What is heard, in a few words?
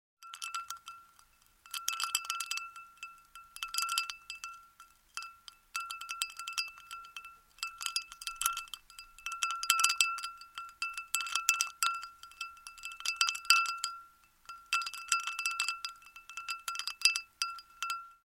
Ice Swirling in Full Glass Of Liquid; Household